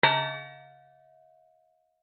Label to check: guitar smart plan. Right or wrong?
Wrong